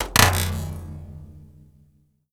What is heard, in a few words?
Power Up, hits and bits; Zero-G; Total Drum Bass